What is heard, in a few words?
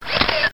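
small fresh style camera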